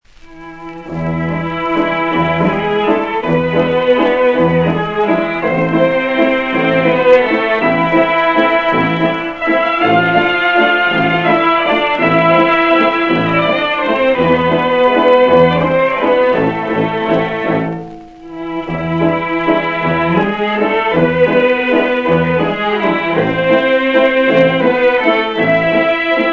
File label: Danube Waves, Concert Orch; Orchestral; HMV C-1278